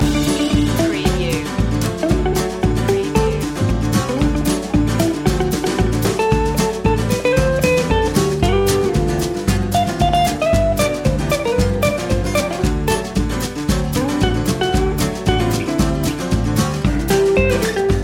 ramblin road loop

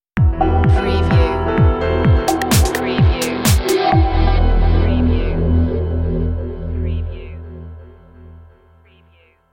plurality move stinger

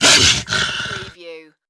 dog creature attack

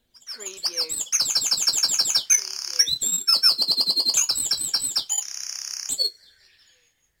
Dawn Chorus in Mold North Wales

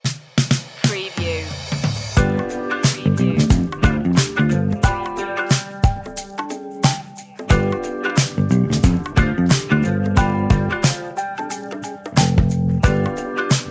warm funky jam

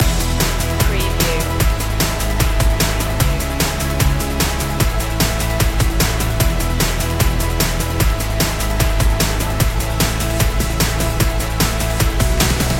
sparks of light loop

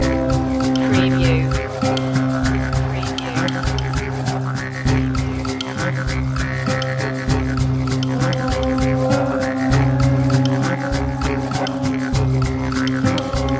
australian ancestry loop; aboriginal music

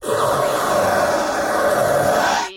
Monster Breath Gasp

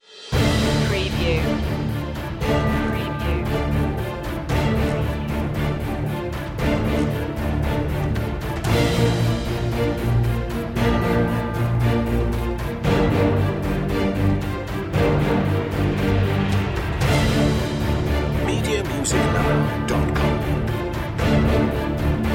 no choir high intensity

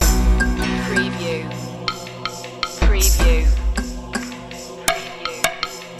indias charm loop

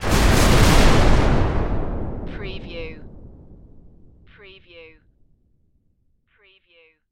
Explosion Massive; Sound Effects Bible

